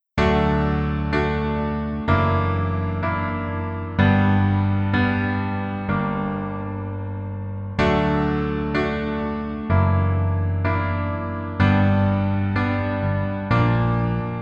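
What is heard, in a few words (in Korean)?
Piano version -1키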